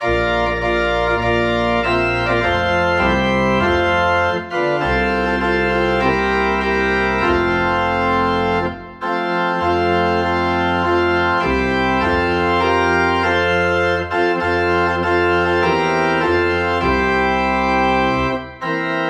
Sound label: Organ in F; A Shelter in the Time of Storm